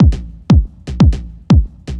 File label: Kick, Trance